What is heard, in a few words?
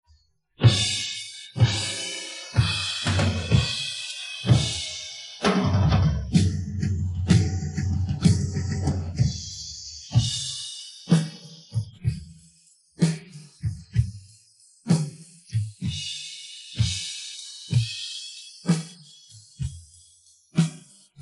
drums